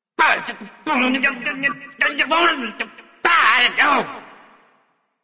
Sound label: grumbling; smite; ahpuch